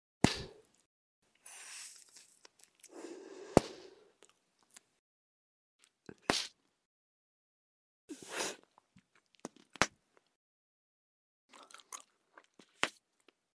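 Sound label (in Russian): neskolko variant naduvan jvachki, several variants of blowing bubble gum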